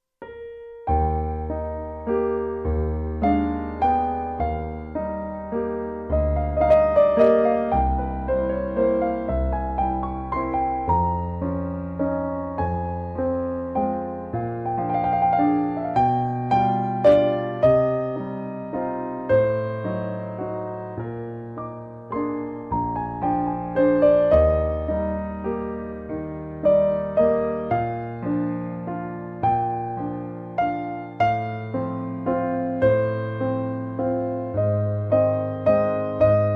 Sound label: Etude in E Flat